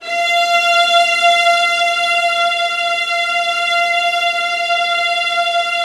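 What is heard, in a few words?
VIOLINS G5; ILIO; Synclavier Strings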